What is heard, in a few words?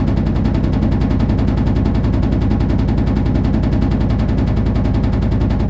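indoor loop; Garry's Mod; tf2; cp harbor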